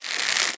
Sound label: archer aim bow; Aragami